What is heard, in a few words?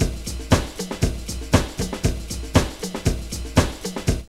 DISCO LOOP; Zero-G; Funky Elements